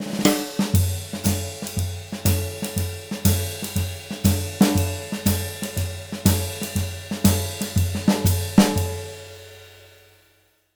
120 SHUFFL; Univers Sons; Jazzistic

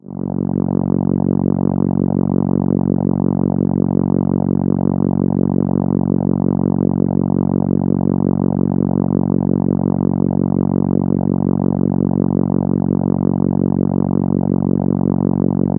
F#3, ARP Solina

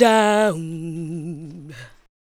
ADLIBS

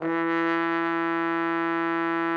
TROMBONE, Killer Horns; Best Service

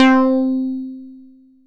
MULTIBASS, Dance Mega Jungle Rave; 90 s Sample CDs; Best Service

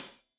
Hat Tight, Hi-Hat, Cymbals

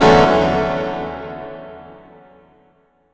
CHORD, Drum & Bass, 160 BPM; Ueberschall; Drum & Bass Flash Trackz

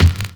BD, BASS DRUM, 70's Breakbeats; USB Soundscan vol.46; AKAI

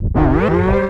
tape fx, scratch; Ambient Grooves

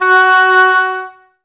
OBOES F#, Wood Winds; Miroslav Vitous; Woodwind Brass Ensemble

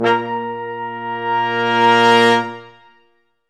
BRS QUIN SW, Quintet sfz; Roland L-CDX-03

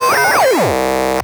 8Bit Fx; Antidote Advent

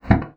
bassoon slap; Xsample VOL-6; C BASSOON

